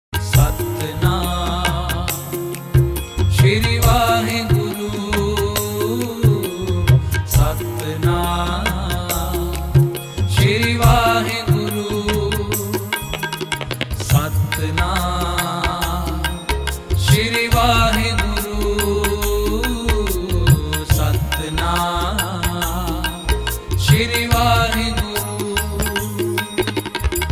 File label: satnam sri waheguru